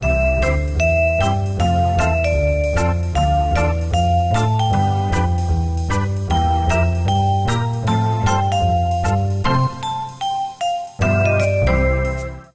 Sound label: login sound